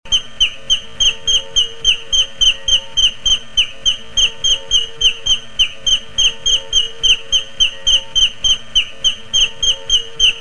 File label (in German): amsel zwitschern